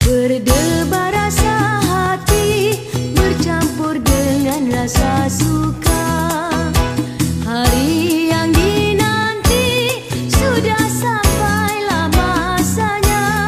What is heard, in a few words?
music background